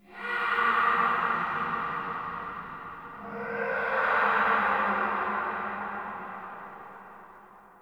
Interior Texture Aggression Calls, Infested, Ambience; Misc Indoor